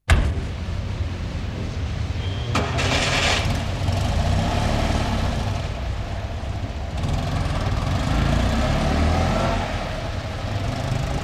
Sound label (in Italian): PARTENZA AUTOMOBILE 500